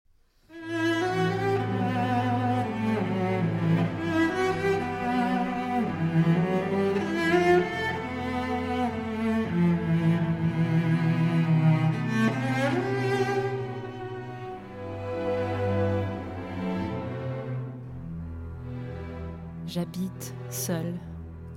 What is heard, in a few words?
Cello and Orchestra